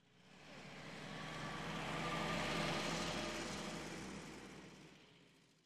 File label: ambience Pickup Right To Left; colossal pinatas